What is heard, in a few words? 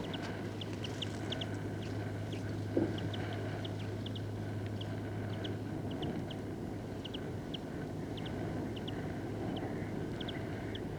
European Greenfinch Chloris chloris